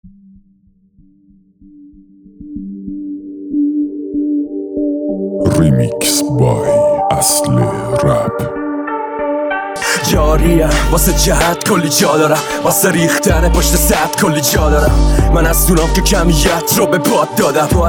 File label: Rap